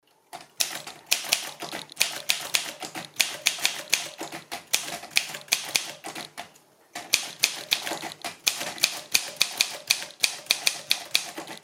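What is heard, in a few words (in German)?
olympia modell sm3, Schreibmaschine; Hörbeispiel Olympia Modell SM3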